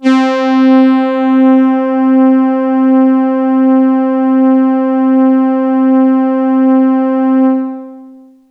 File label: STRINGS C6, Synths